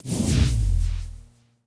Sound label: force throw